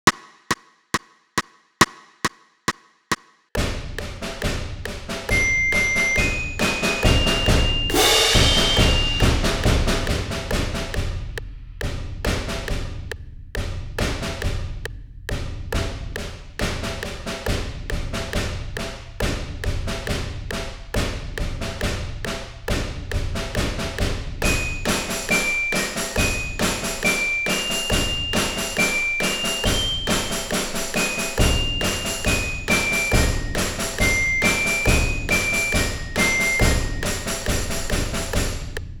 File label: with click